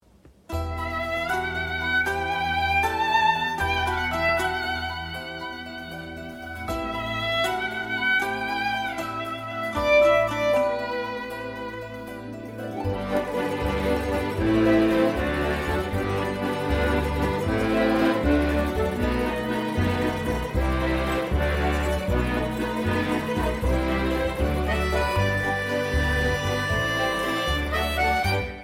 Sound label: Bgm; Barfi